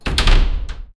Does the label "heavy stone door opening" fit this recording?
Yes